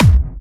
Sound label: Kick; One Second